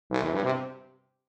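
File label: sounds of tuba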